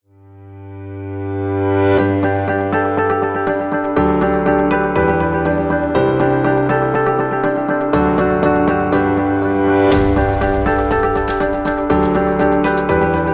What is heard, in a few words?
Upbeat Corporate Motivation, corporate music; corporate music vol 9